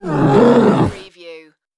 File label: creature vocal